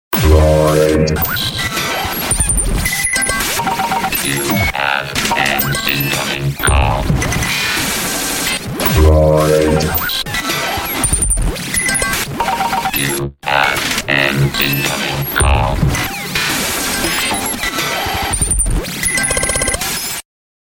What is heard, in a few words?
motorola ringtone classic droid x